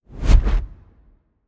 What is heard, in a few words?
Night Wind R O C K